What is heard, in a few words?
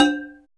TECH TONE; TEK PERC2